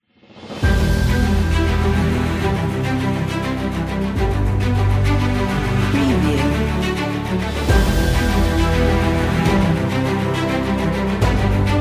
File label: Horror Music